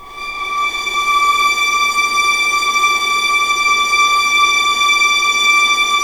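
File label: D4 legato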